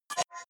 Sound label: hover fast hard; maschinen; signale; soundpool